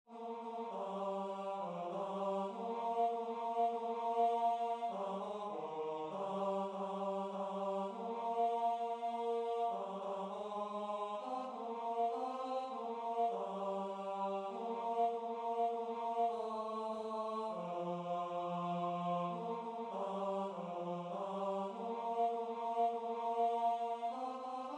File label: Choir Tenor